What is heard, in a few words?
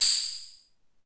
brush; Maestro G2